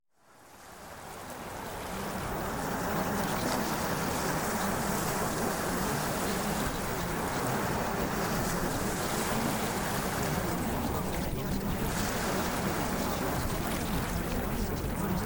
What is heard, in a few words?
Ocean Waves